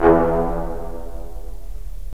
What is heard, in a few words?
BRS, Roland LA Composer; Roland - LA Composer vol. 3